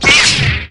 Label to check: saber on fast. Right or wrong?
Right